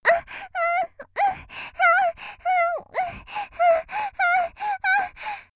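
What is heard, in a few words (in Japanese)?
（少女）「喘ぎ」ノーマル, 元気な少女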